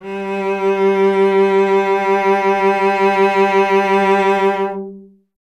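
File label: strings cello G; Miroslav Vitous; Solo Instruments VOL-1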